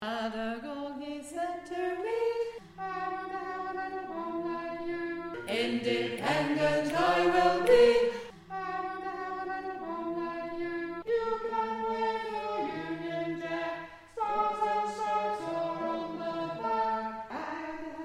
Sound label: Tenor